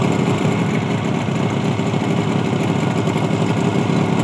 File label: machine Engine; angry birds rio; Forestmachine Engine